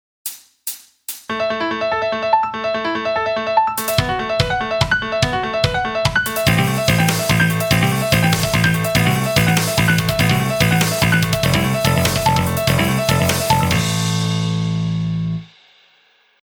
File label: Super Shred Guitar Exercise Backing Track